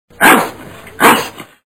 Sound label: ruff